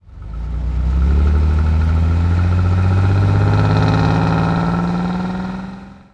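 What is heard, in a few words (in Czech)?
auto jede; Mafie 1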